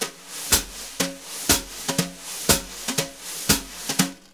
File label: BRUSH, Jazzistic; Univers Sons